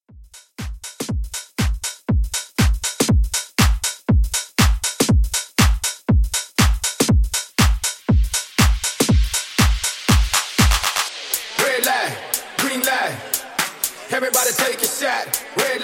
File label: Club Electropop 120Bpm